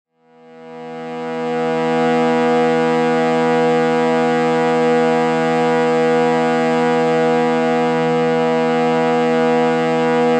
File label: Shruti Box E B 432Hz